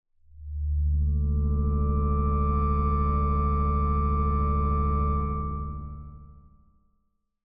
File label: Pad; Routed Sound; Sylenth1 Expansion - Flame Summoner Vol.1